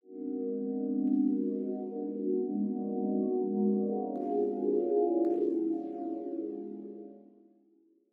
Pad; Dubstep

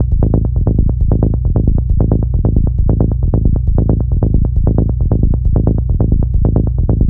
Bass Loop B 135, Techno

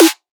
Riddim Snare; One Shots; Ghosthack; Riddim Essentials 2020

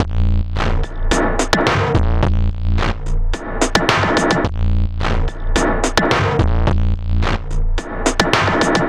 Drum Loop, 108 BPM Room, Experimental; Drum Loops; Loop Stems; Cleansed

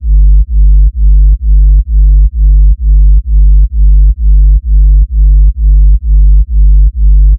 Bass Loop 130 G, Techno; Ghosthack; Free Techno Sounds 2024

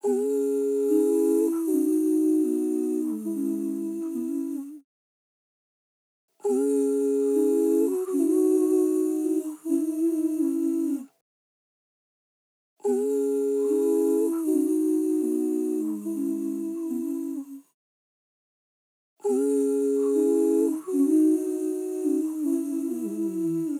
Vocal Dry, 75 BPM C# Min; Cymatics; Infinity Vocal Collection; Angels Vocal

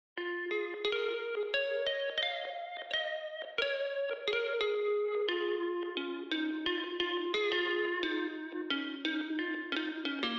Classic Melody Loop - 88 BPM A# Min Pluck; Melodies; Loop Stems; Cymatics; Oracle Sample Pack

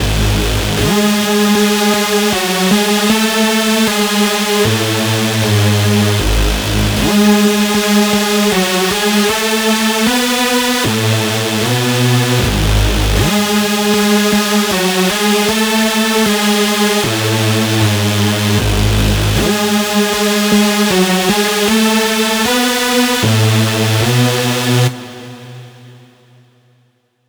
lead loop G#min 155; Music Loops; GhostHack

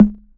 Perc; Love Life; Cymatics; Trap Starter Pack; Percussion

